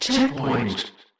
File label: Checkpoint